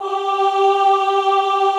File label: OHH G3, Classical Choir; Best Service; Extended Classical Choir